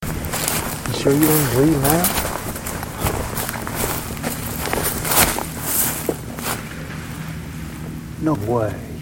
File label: Tree Fall